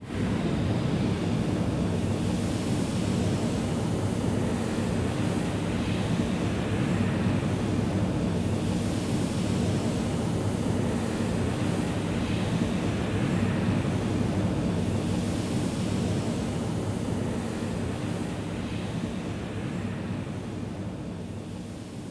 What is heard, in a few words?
Basilisk Broom Idle Loop, Rocket